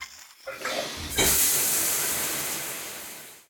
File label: shower Start